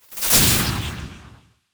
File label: Electric Magic Lightning Zap; Cielonos